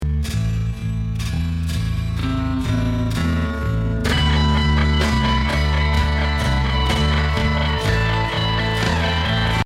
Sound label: solo, edge delay